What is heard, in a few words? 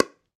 PickelballPaddle Hit Ball Light